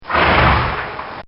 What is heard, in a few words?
Horse Snort; Animals; Bobby Cole